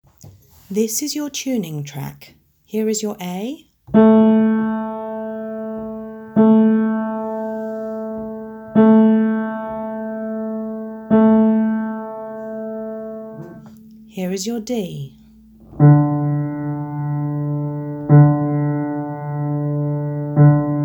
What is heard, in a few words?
tuning track, cello